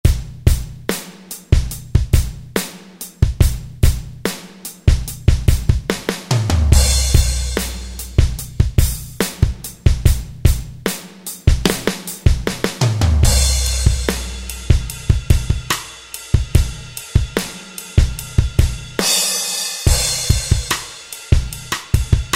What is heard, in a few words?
Standard Kit, Roland Fantom XR, Rhythm